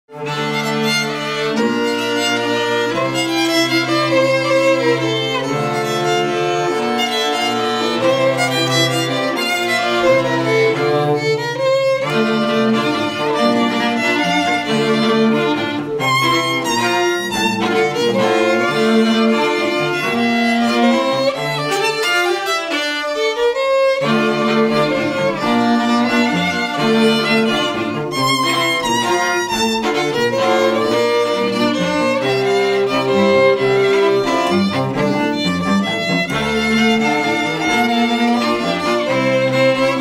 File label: violin